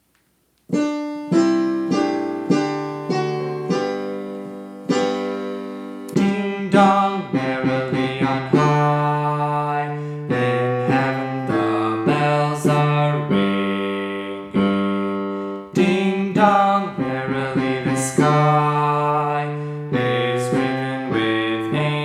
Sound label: Bass